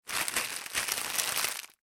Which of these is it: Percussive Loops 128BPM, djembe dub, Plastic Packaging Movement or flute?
Plastic Packaging Movement